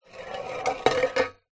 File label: food tin can roll on wood surface then hit side; zapsplat